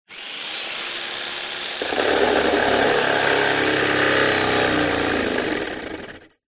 industrial power tool electric blade cut wood short off